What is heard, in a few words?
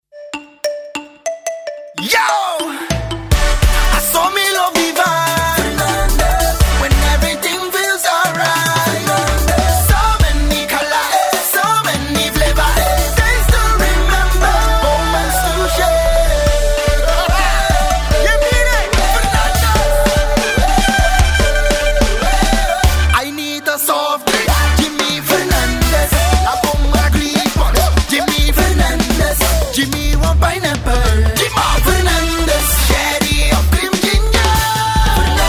Jingle; Fernandes